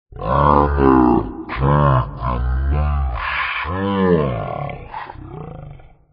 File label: train serious; vapesquared